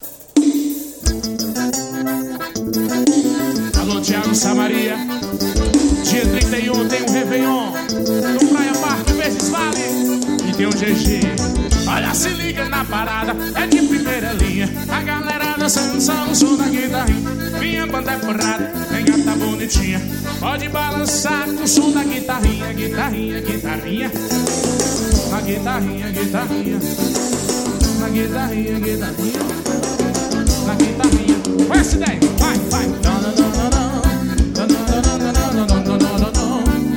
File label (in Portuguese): forro guitarrinha